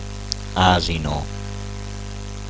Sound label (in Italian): asino